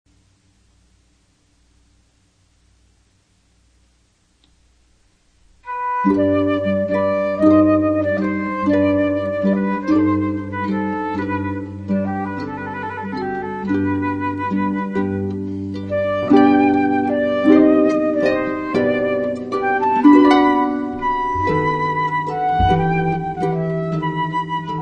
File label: Harp Flute Sicilienne